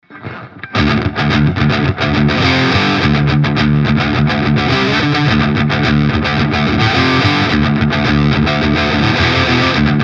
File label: Gain 12h Rhythm; FGN J-Standard Iliad 664; Steg.